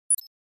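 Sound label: Flash Beep Electronic Tap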